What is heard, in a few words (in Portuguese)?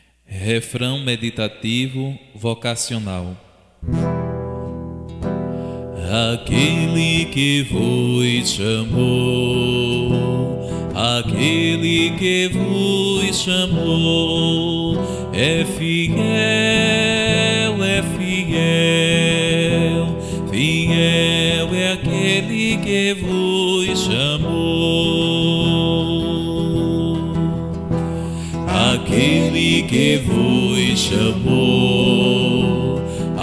refrão meditativo vocacional